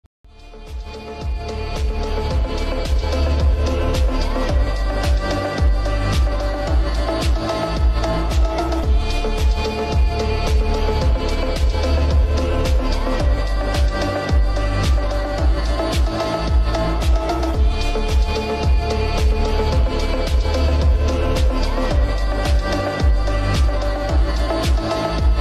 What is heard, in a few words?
remix reverb bass